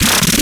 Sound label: bass purge